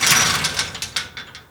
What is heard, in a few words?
gallery stop; Counter Strike Model Pack; CS:GO; training